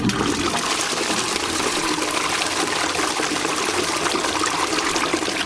klospuelung

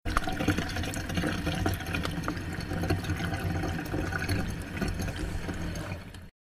wasserhahn

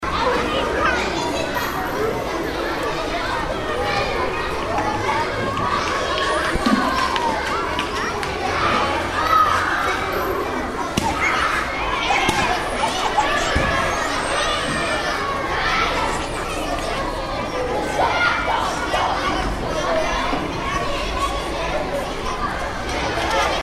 pausenhof schule

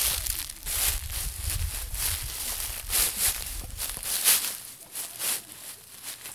Blätterrascheln

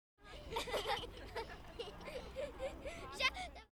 kind lacht

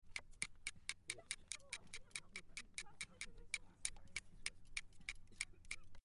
schnitzen

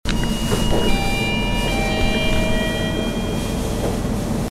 klingel baeckerei ofen

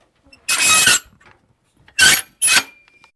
trittleiter

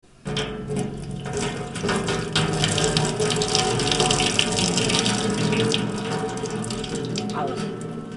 wasser in metallbecken

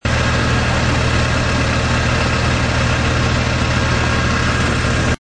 baustelle

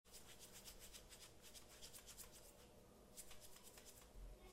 salzstreuer

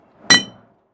flaschen klirren zusammen

